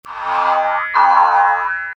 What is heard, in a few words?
Fatty Spring; Best Of N2O Library